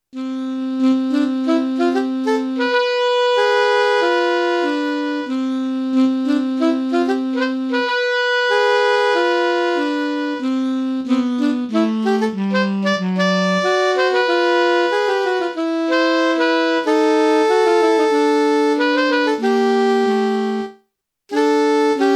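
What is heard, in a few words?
Alto Sax, STANDARDS ALTO SAX DUETS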